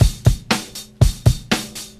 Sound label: Drum loops Funky